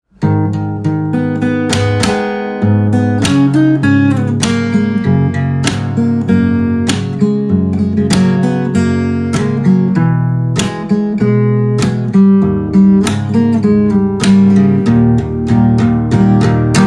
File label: Guitar Slow